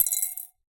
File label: Drop In Glass, Medium Wine Glass